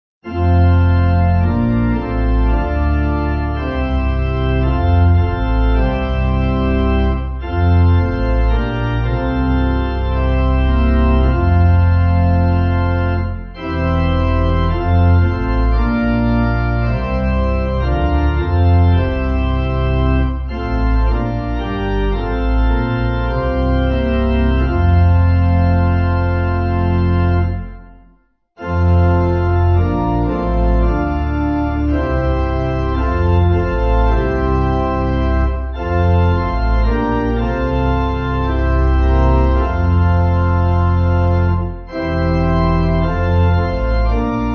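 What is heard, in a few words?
Pipe Organ, Music Backing; Spirit Divine; Nun Danket All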